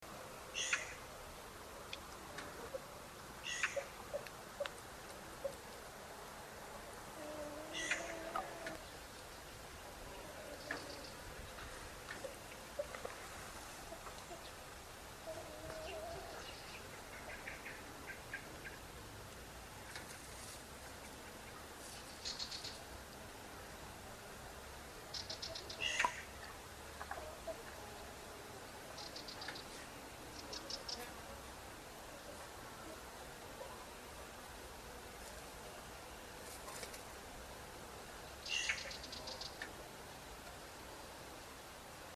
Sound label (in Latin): Pica pica; SETE